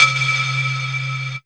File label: DRMSND, Pure Electro, BEATKIT; USB Soundscan vol.03; AKAI